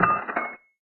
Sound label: Currency Midas Flyoff